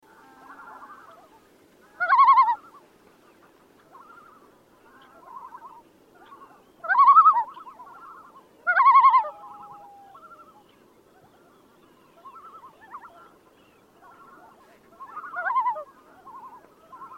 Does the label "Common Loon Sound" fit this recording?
Yes